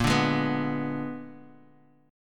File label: A sharp 7th Flat 9th, guitar chord